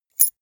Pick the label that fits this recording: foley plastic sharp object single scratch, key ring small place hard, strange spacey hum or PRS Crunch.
key ring small place hard